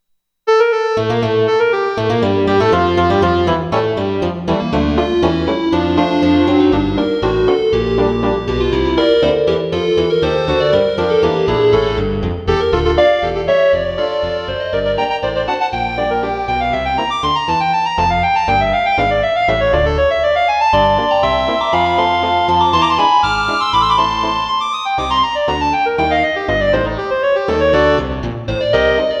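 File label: coquettish polka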